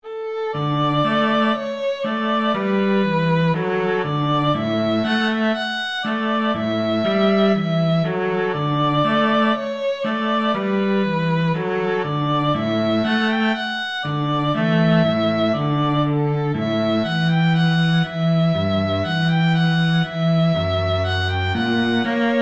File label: violin-cello duet; Brahms Sym1